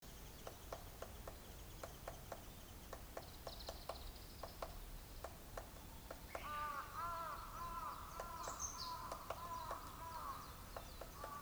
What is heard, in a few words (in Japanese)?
aogera